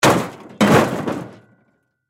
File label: Crash Car Hood Object Drop; Crashes; Blastwave